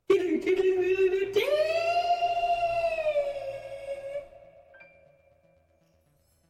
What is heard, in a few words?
guitar riff